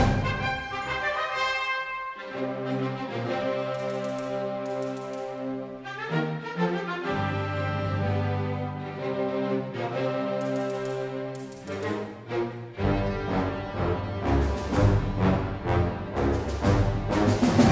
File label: menu loop; Lux Ahoy